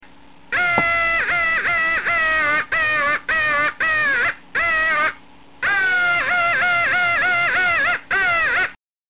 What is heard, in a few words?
yodel, The Yodel Call, crow